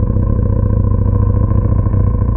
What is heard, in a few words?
Sci-fi UFO Loop; SFX Kit; Sci-Fi Loops